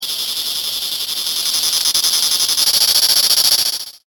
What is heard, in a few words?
cicada